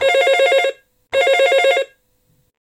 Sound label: Late 80s Phone